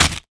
Cabbage, household rhythm samples Hits

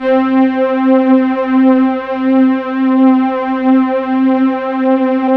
PAD, Synth Pads, Roland; SYNTH-PADs 1

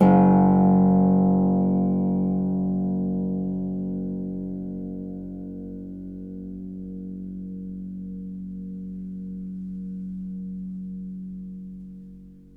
HS G1, Harp; Miroslav Vitous - Percussion & Harp

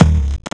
Thud Kick; EMU MoPhatt

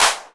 CLAPS HIP, CLP; 90 s Sample CDs; Best Service Dance Mega Drums

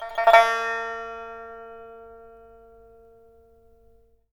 SNXN TREM A#4, Heart of Asia; Spectrasonics

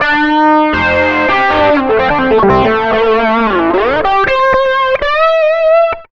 SOLO R, Electric & Acoustic Guitar Loops; USB Soundscan vol.04; AKAI; 120 SOLOS

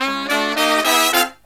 SLEEP, Killer Brass Riffs; USB Soundscan vol.29 - Killer Brass Riffs; AKAI

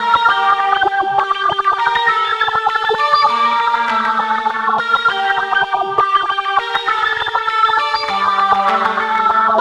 Synth Pattern; Electrocution

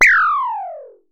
Synare Filter; Star Instruments Synare 3

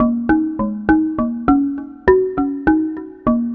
Marimba Low; Ambient; Melodic; Ambient-Melodics

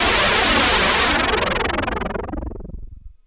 Juno-60, Filtered Noise; noise hiss crackle

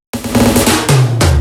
Fills, Loops 140 BPM

